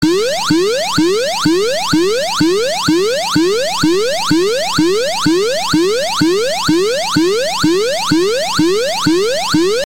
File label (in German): Alarm Sirene; Alarmton-Sirene